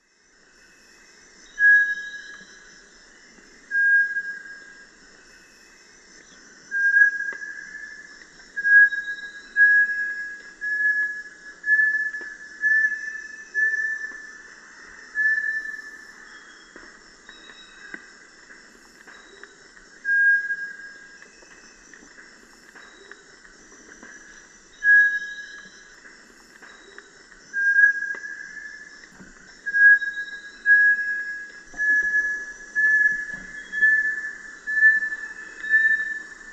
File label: Crypturellus bartletti, song; S. America; Eastern Lowlands